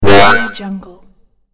robot game jump

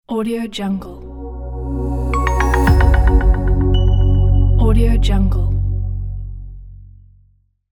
clean logo

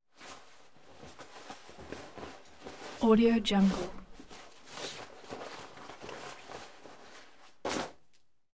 taking off waxed hunting coat; collanderson

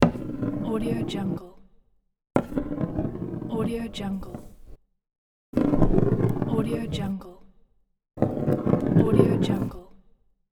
ball rolling

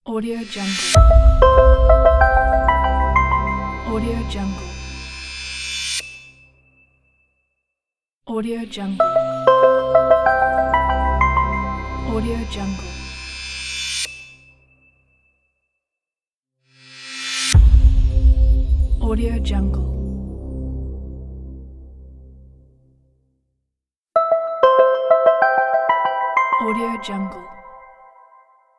ambient logo opener

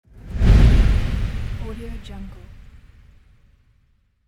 airy woosh

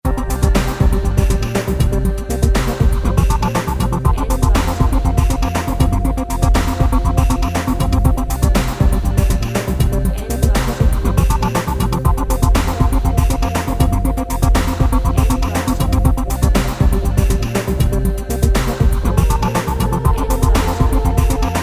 beaty loop; architecures beaty loop; trix music

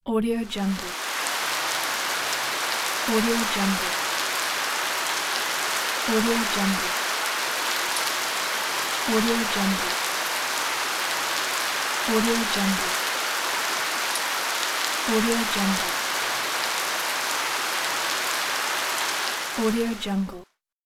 rain

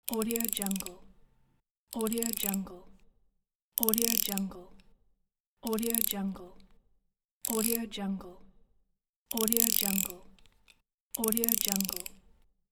mechanical; rapd

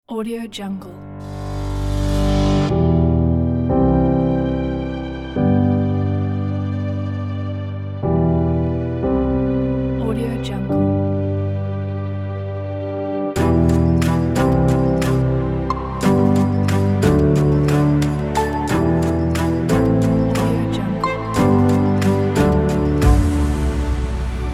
epic motif

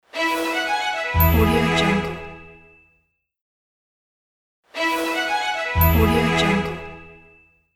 short uplifting logo

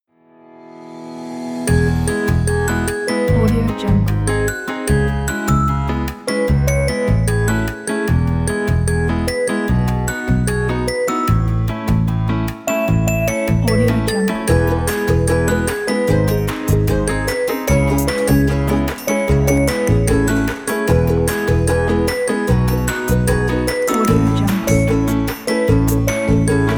happiness

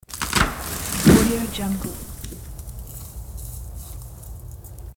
tree fall snap and quicker fall

